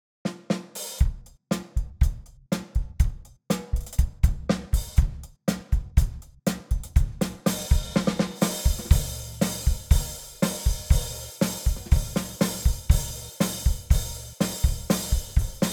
Hihat, cymbals hi hats; drums percussion; Gusta Star Light Cymbals

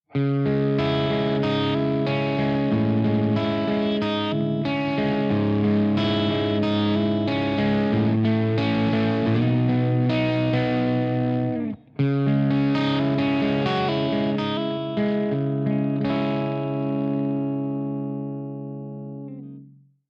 Clean Mid, electric guitars; Gusta EX All Black MOO Big Ass Signature

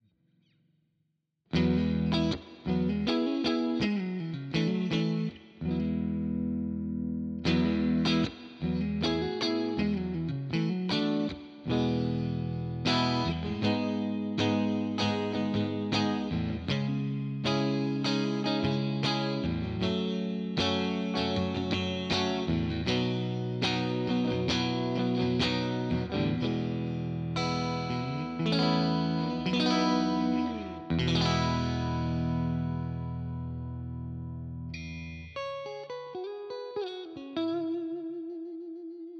Neck Mid Clean, electric guitars; Gusta GST Standard; gst models

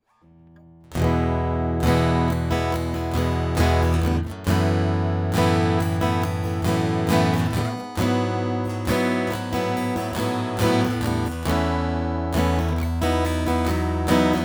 Strumming Direct, acoustics orchestra model; Gusta GMX3

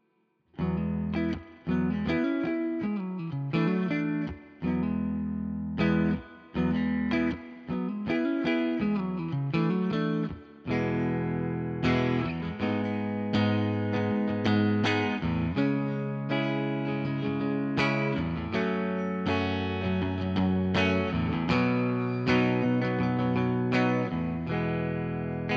Mid Clean, electric guitars; Gusta GTL05; gtl models

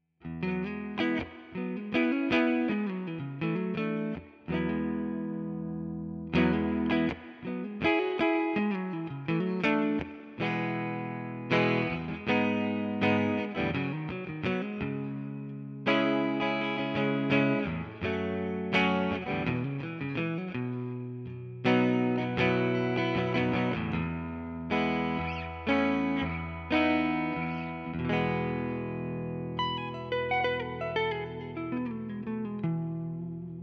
HSS Mid Clean; electric guitars; Gusta GST 05 HSS; gst models

